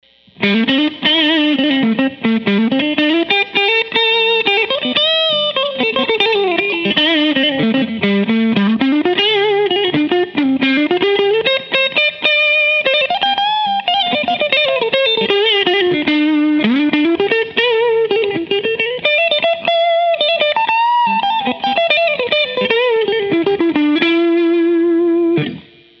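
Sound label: prs both clean lead; prs22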